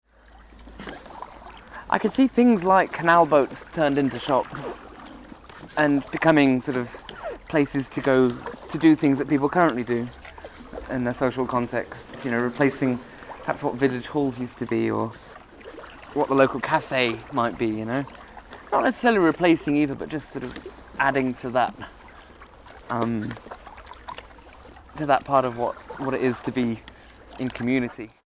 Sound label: shop boats; kayaklibre; 2011 Birmingham